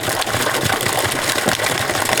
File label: Toxic Loop; Blastmorph Pinball; xbox series xs